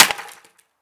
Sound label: wood distant; Escape from Tarkov